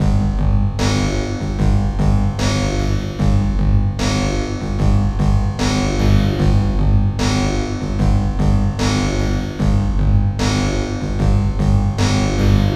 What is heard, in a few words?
Robo Beat, Percussion